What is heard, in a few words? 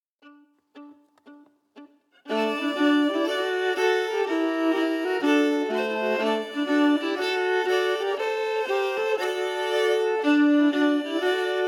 Schottis harmonies emphasized; Schottis fran Lima